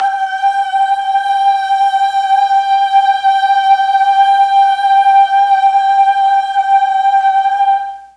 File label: G3 BOYS CHOIR, Mellotron; Mike Pinder - Mellotron Archive CD